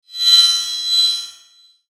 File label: SHIMMER, Shimmer Electronic Metallic Ring; Multimedia; Blastwave